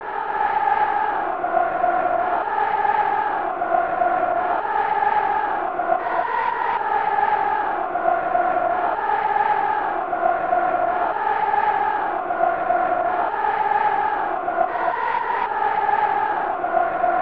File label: Crowd Chanting; kick off 3 1994 snes